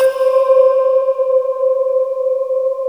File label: bowww C5